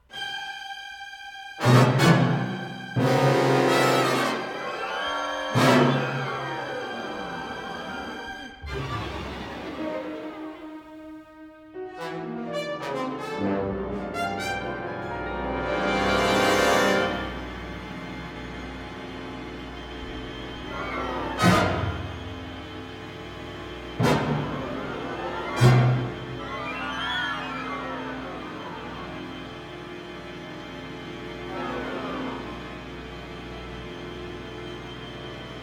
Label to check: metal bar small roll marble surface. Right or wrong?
Wrong